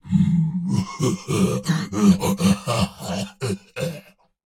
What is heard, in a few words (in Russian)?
Смех Фраза; Турбосвин